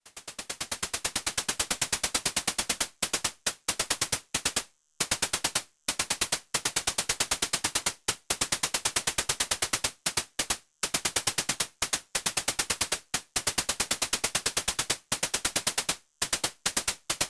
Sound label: Pittsburgh Modular Lifeforms Percussion Sequencer Add Drop